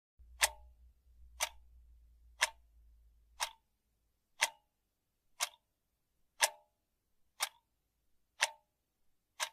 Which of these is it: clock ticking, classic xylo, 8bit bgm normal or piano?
clock ticking